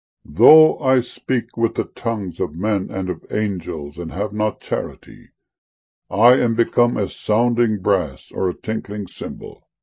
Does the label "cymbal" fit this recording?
Yes